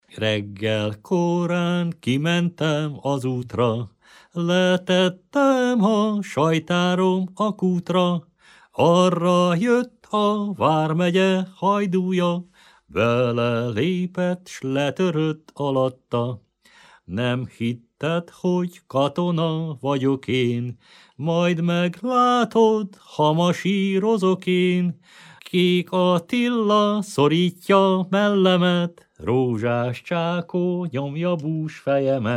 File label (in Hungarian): nepdal; reggel koran kimentem; nepdalok